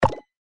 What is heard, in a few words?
click